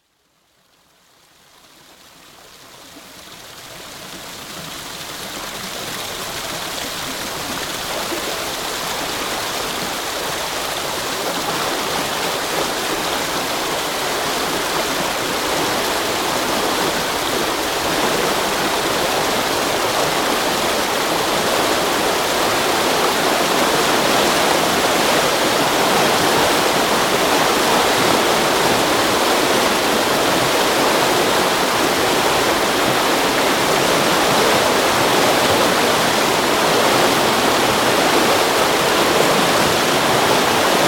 River lock filling on River Thames; Rivers; Nature; BBC

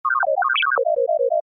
Computer Beep: Odd Effect; Communications; Stuart Duffield

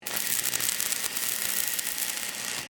Welder Run; Welders (Sound Effects:Machines); BlastWave

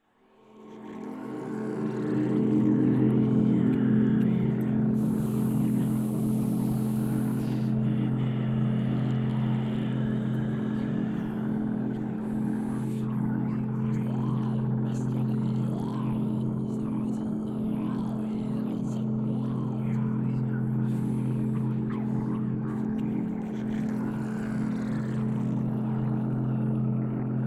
Drone Reverb Swell Demonic Whispers; 5.1 STEREO MIX; Blastwave

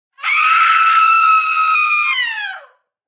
Shriek Female, Female scream, shriek; human voice; Screaming; VoiceBox

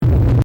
Distortion Thick; Sound Design; Distortion Sound Effects

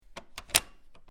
Closing Metal Doorknob; Doors; CraftedSignals

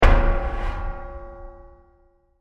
Deep Metal Impact With Additionally Scratches; Crafted Signals

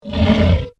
Monster Growl, Horror, Monster, Growl, Creature; Monsters; BlastWave

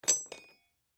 Pipe Pick Up Fast Rocks Tool Wrench; Machinery Sound Effects; Blastwave; Foley

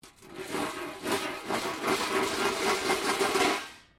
Metal rubbish bin lid spin concrete

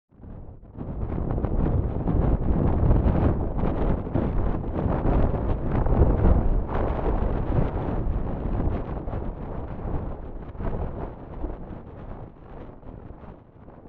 Rough Gritty Atmosphere, scary soundscape; horror; Andrew Fleming

